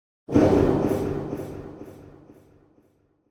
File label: Impact Drop Distant Shock Hit; Hollywood Edge; sound designer's toolkit v2